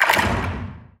arms up; forsaken

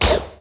imp shoot

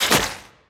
spit tar; gish

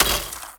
kick

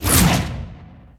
blackhole throw

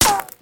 ricochet; gfuel

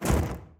flap; fallen

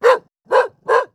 dog bark

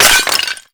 pot break

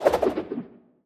death sickle start

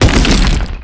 maggot burst out

